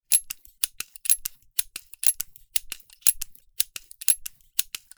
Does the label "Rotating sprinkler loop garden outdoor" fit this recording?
Yes